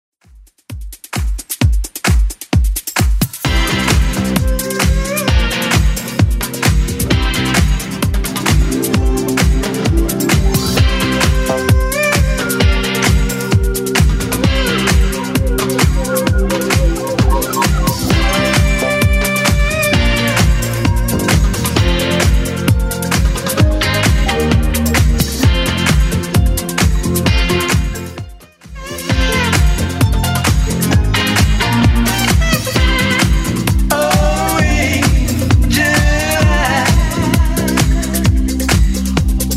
70s Club 131 bpm CLEAN

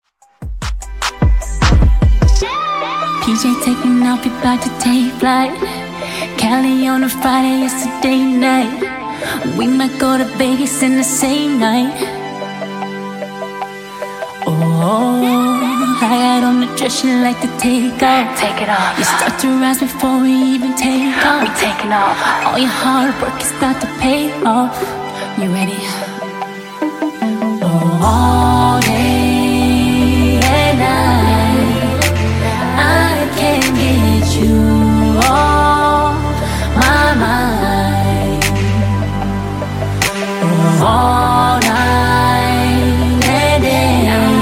Funk Intro Outro Edit 75 Bpm

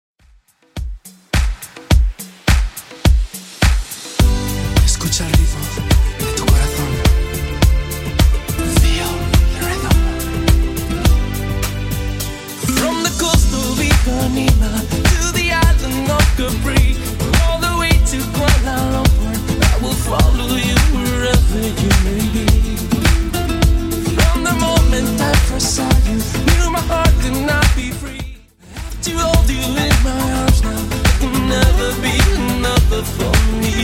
90s Redrum 106bpm

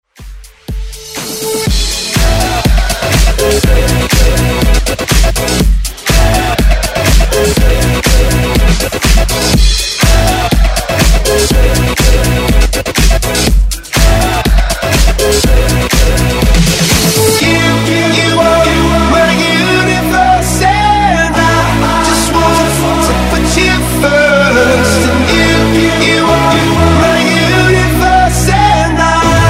Pop Dance Redrum; remixplanet

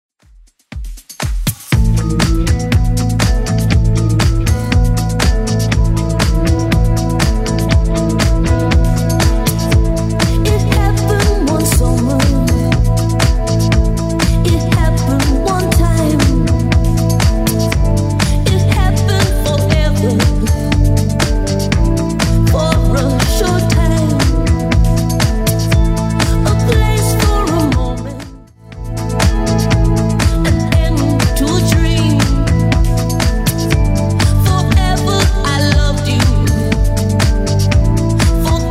80s Club 120 bpm; 80s Club Edit

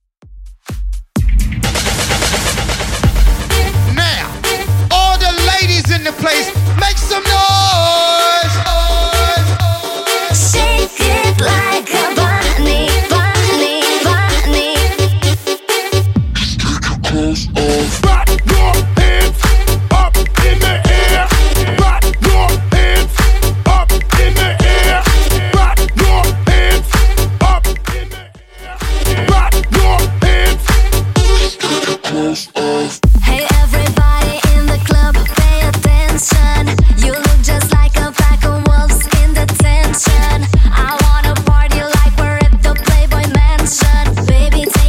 2ks Latin Redrum 128 bpm

